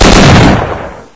doub barrel, weapons

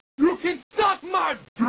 alien hollow, ambience

